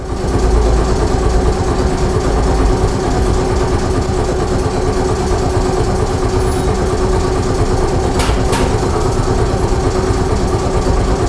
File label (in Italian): compressore, tram